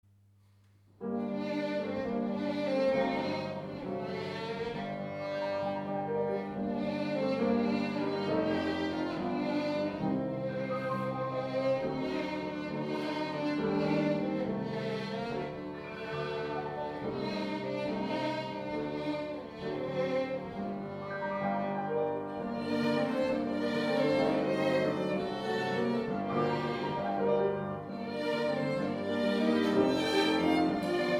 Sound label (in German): Ungarischer Tanz Nr. 1